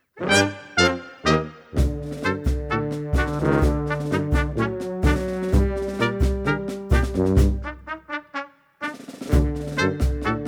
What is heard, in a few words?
La Cumparsita Tango